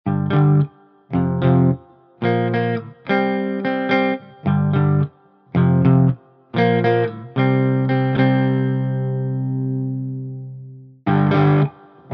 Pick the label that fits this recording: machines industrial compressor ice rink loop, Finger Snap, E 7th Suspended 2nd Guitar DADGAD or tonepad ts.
tonepad ts